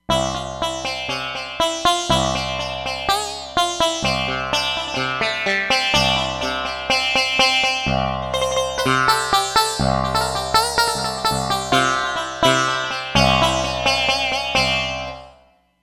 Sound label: Elec Sitar